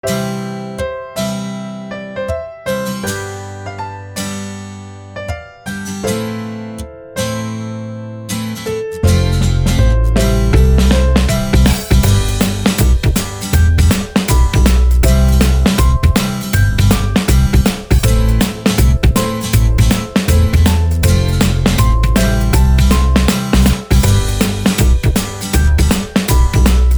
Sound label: Upbeat Commercial Music